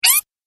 Arcade Game Slide Squeak; Video Game Sound Effects; Retro Inspired Sound Effects - Think Nintendo, Atari, C64, Etc.; Andrew Fleming

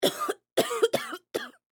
Human Female Cough; Sick (Sound Effects:Human)

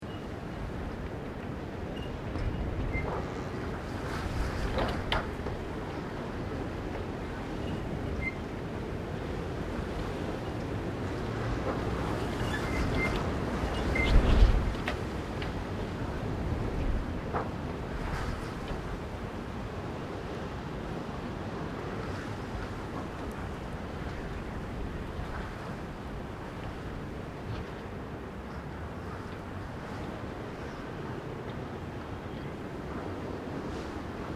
Wind Storm Heavy Creaking House; Elemental weather effects

